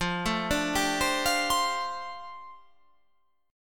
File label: F 6th Add 9th F down, chord